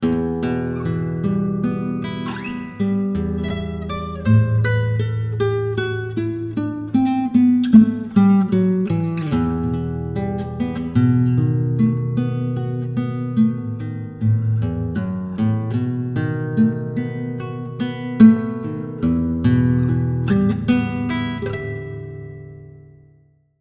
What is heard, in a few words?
loop instrumental